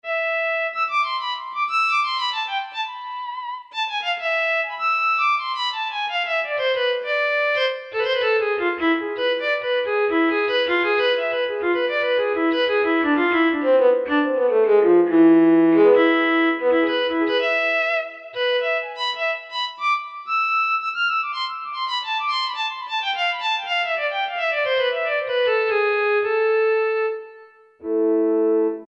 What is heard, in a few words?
Soloviolin, Korg T3